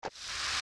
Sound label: push crawl; Jabu Jabu; zelda; OOT